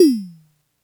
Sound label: Toms, Elektron MachineDrum SPS1 MKII